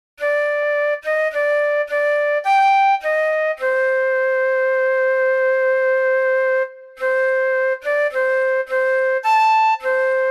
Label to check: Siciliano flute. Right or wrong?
Right